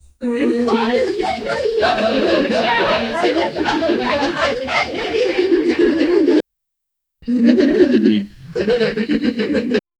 low group mocking scatter; social

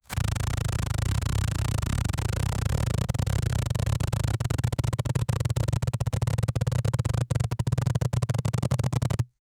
friction

